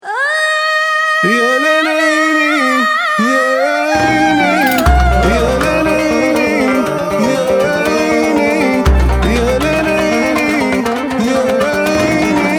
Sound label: israel middle east essentials vocals